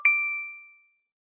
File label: iphone text message tone